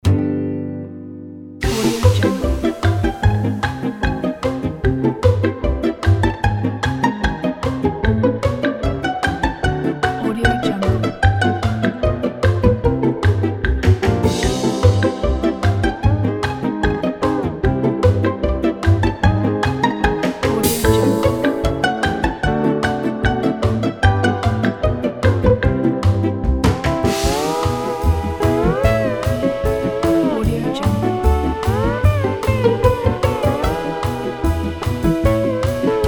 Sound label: pizza hawaiian orchestra; smidsmid